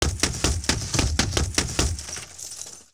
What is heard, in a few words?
Bullet Into Windshield Multiple